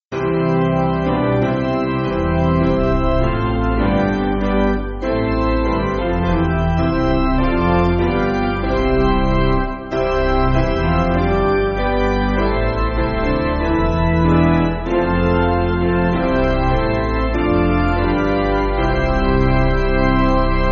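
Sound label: Piano, Music Backing Files for Churches; Basic Piano & Organ (CM)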